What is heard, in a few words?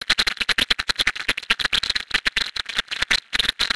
scanner talk; hl2